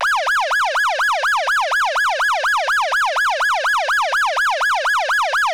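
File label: Federal Signal Touchmaster Delta Wail, siren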